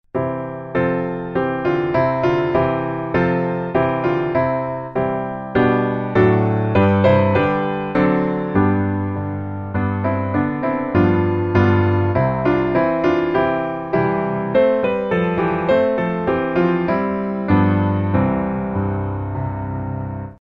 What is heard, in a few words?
piano